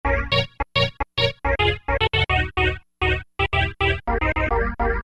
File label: hit-Carhorn; E-mu Orbit V2; Factory presets